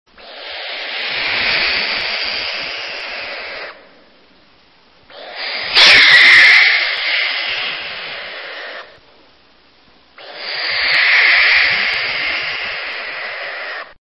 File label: Barn Owl